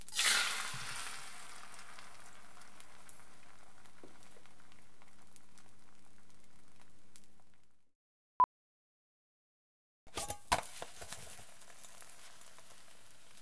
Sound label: Making Pancake